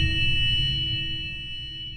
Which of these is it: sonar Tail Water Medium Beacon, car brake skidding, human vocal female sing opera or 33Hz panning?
sonar Tail Water Medium Beacon